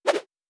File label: Whoosh; Fantasy Sounds Bundle